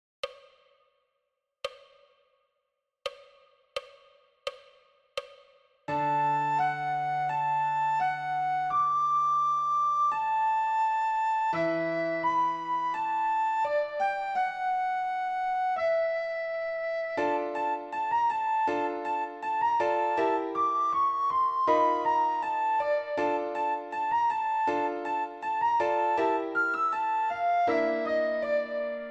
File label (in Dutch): sopraan; Home Alone